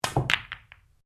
Billiards Cue Cushion Ball Ball, Home billiards table; Pool; Sports